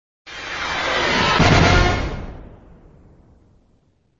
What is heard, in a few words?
Sound SFX, flipper